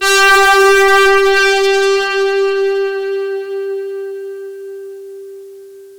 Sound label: big brass G3